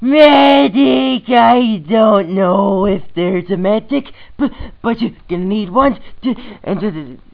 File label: medic song